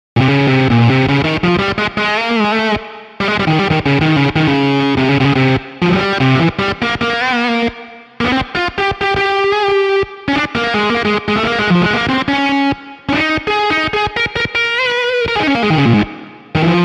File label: GUITAR SOLO